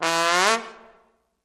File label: Trombone High Rip Up